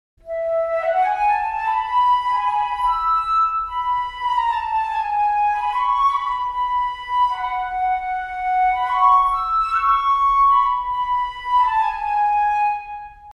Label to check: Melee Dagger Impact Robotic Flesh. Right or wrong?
Wrong